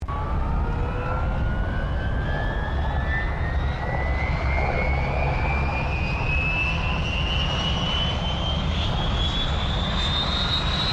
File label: HELICOPTER UNKNOWN STARTUP, Various unknown Helicopters; Sound Effects:Vehicles